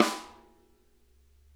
rim mf L, snare; Sonic Reality; Interactive Drum Kits

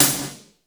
BG SNR, METALIC DRM; Crypton - DrumBase5000; METALIC DRM2

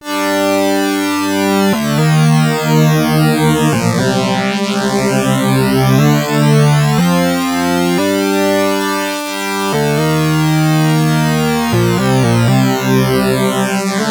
C64 Synth Phrase FX; 8-Bit; Chiptune; Commodore 64

